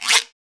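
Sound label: percussion guiro; Roland L-CD701; PRC Latin 3; Guiro&Cuica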